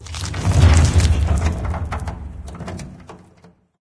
stalker shakes; ambient; source engine; episodic

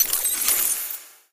key minimize; ui

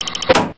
button; source engine; hl1; video games

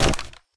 jump landing, player; source engine; left 4 dead 2

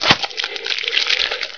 barnacle bite; video games; source engine; hl1

